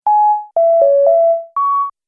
Multimedia Music

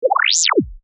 Computer Beep, Short; Multimedia; Stuart Duffield

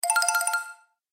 Musical Game Elements, Video Game

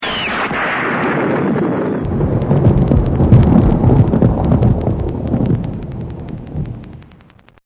Thunder Lighting; Weather; Partners In Rhyme

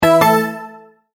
multimedia tone verified

